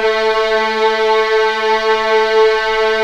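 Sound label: A3 LEGATO F, VIOLINS; East West; The Ultimate String Collection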